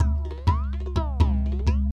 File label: PERC LOOP ETHNO 125